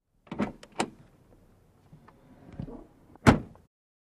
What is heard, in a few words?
Car Door OC BMW, Car Door Open And Close 4; BMW, Close Perspecti